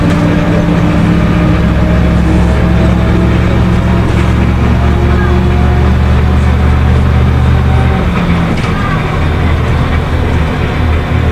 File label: drive, vehicle; liaz677